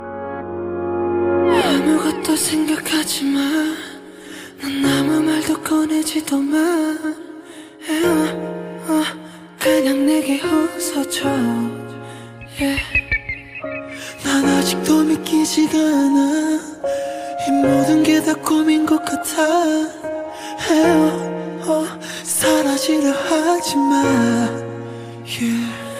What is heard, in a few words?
ringtone